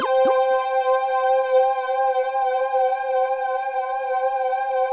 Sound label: SYNTHESIS; Pro-Rec - Synthsonic; JV FILM